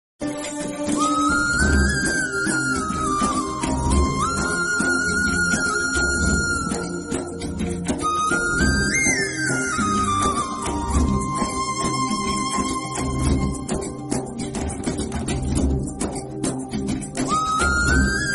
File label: rain theme instrumental